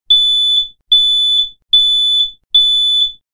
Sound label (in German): Feueralarm Short